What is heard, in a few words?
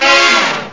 sax stng; Atari Mega Archive; synths